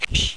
shhhiiick

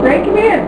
crank; Fishing Flash Cards

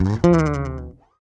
Bass slide, Rock; ACID MUSIC

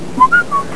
BATH WHIS

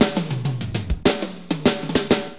Drums, AcidJazz Funk

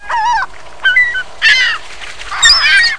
seagulls; aantics

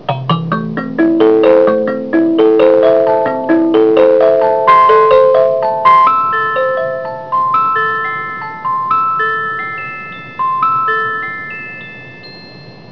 kalimba; Kids Can Save The Earth

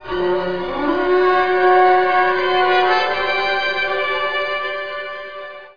mystery

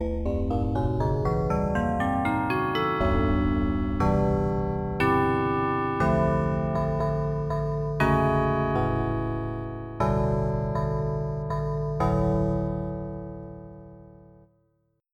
Tinker Bell; EverQuest

